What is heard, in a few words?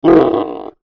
Monster Bear Groan Grunt; Creature; Horror; Animal